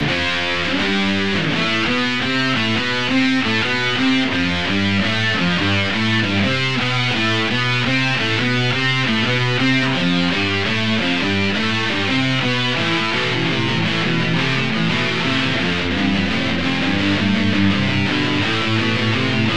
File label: Unmastered Guitars Reamp